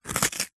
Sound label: foley card toilet roll rip small section; zapsplat